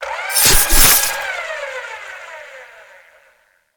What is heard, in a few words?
spinning cut; battle